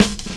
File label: Persistent Cut, Drum Loops 165.5; Zero-G Jungle Warfare 1, 2 & 3